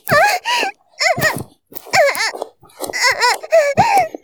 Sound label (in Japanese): 絶頂, ASMR; 騎乗位